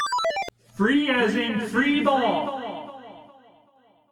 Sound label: freeball, Pinball Sound Effects